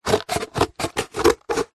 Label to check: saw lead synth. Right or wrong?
Wrong